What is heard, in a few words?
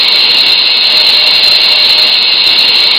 Stop Break Snd